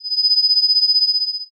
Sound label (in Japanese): shiin